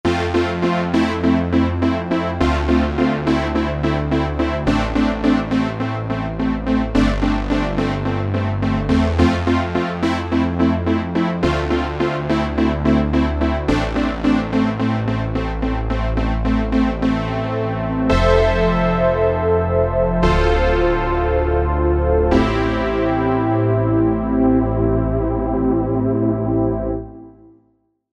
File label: Vintage Saw